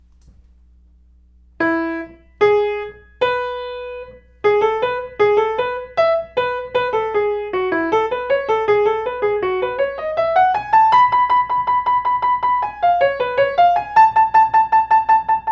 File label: Bach Violin, ear training; ear training level 3